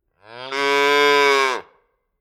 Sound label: Nature Farm Animal Calf Baby Cow Mooing Hungry Single; Cows